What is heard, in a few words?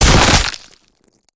snap, glue; infestation